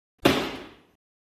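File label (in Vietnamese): Tiếng đập bàn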